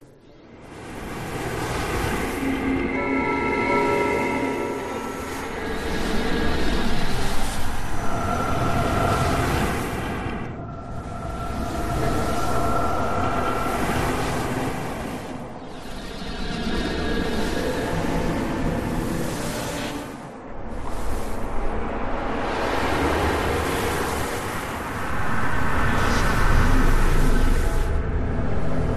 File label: dimension glitches ambience loop; the sound pack tree